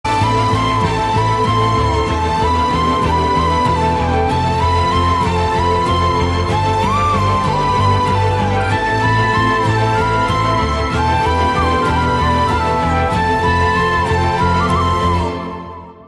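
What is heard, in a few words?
Love background music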